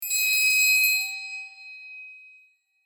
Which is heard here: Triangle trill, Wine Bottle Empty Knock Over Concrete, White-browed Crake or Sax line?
Triangle trill